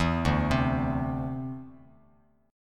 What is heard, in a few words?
D 7th Suspended 2nd down Bass Standard; D7sus2 arpeggiated